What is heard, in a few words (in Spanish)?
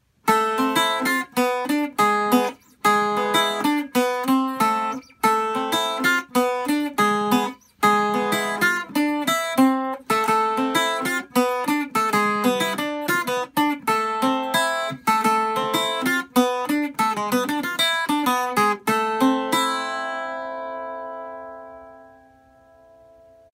tres cubano; Sonido del instrumento; Alexander Cruz